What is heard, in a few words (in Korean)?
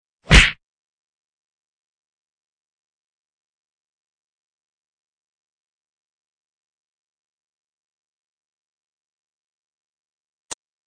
Fights, 싸움-전투; 헐리웃특수효과음